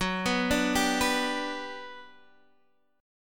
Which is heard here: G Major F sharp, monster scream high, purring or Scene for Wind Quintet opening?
G Major F sharp